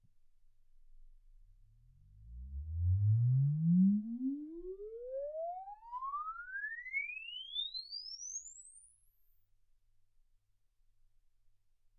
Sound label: exp swept sinus 10Hz 24kHz